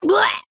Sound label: Male Human Death; Leeroy Jenkins